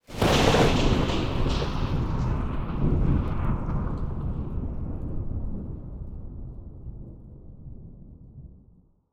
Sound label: thunder close; dracula